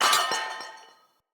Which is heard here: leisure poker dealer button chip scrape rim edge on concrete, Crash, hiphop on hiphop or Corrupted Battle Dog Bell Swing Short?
Corrupted Battle Dog Bell Swing Short